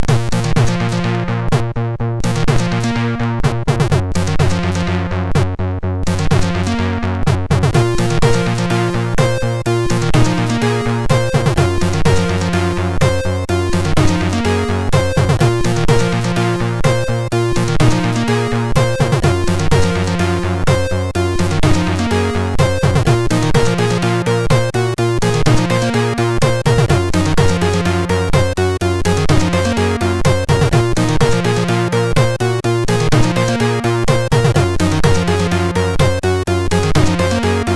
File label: Intro Tune, sid MOS6581R2